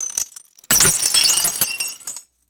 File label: porcelain object crashing and shattering; destruction